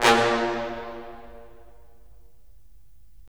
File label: BRS, Roland LA Composer